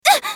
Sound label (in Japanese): 被ダメージ; カリュケ